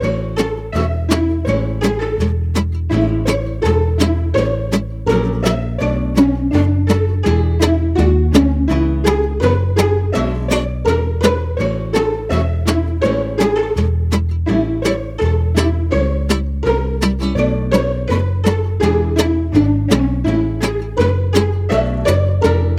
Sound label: cheerful entertainment pizz no bells; by burak sound bank; cheerful vol 16